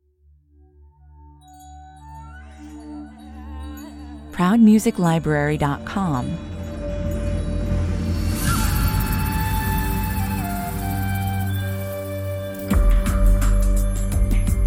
native american anthem 85 bpm, Moderne indianische Hymne über das Leben der Inuit; indianische Flöten, Gesang und Schlagzeug; Bezaubert durch indianische Flöten, Gesang und Schlagzeug